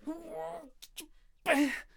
Ice Archer Attack Vocals

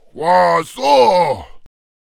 Samurai Giant Attack Vocals

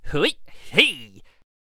Ball and Chain Attack Vocals

